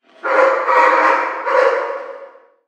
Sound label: Dog Chase Target Distant